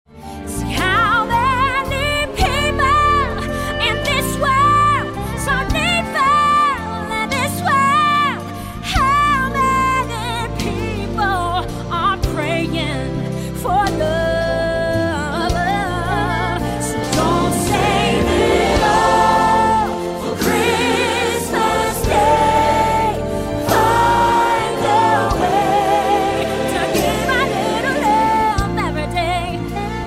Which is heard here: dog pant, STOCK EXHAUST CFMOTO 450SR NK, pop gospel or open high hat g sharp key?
pop gospel